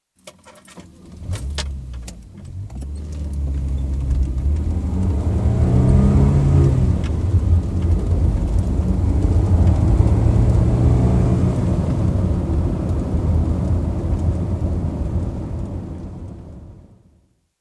Police car start and depart without siren, interior acoustic; Emergency Vehicle; Emergency Vehicles; BBC